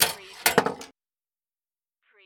Pinball sound, ball lands on rail, rolls and drops